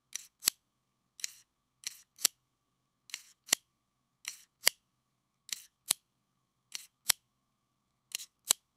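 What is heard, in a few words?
sharp and satisfying slice, cutting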